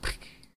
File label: rope shot; Hedgewars; HedgewarsMobile